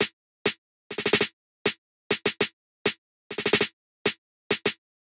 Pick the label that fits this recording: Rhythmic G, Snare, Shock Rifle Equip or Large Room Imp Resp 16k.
Snare